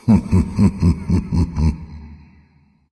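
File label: "heh heh", nonverbal; smite; voicepack; khepri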